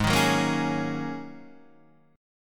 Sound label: Ab 9th Suspended 4th Ab down Guitar Open D